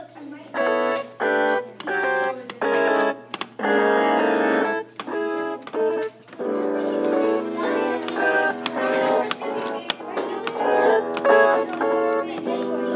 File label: me playing the guitar